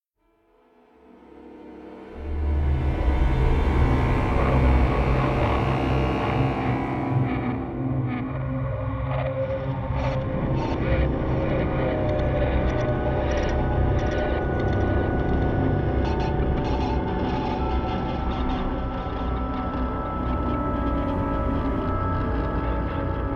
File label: Music Action Scary No Rhythm No Choir; starcraft 2 wings of liberty; MissionMusic